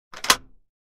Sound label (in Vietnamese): tiếng ấn nút nhấn nút